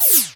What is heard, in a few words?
Internal Drums, Roland JD800